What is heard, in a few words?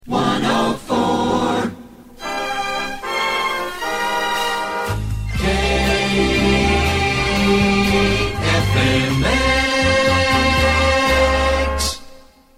FM Jingle; KFMX; 1977